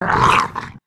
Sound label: female zombie attack; nmrih; zerosiki; slender